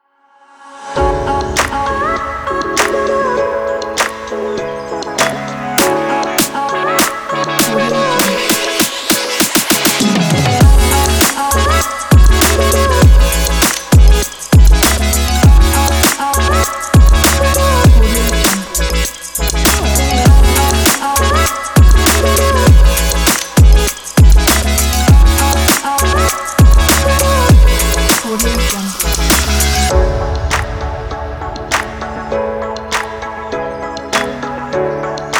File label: future bass; phoenix-sound